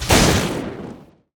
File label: arc; garrysmod